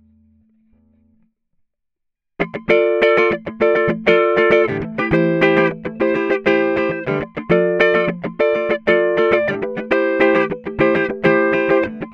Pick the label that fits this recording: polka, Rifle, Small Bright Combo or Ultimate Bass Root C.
Small Bright Combo